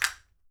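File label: SHARK CALL, ethnic percussion; WorldSounds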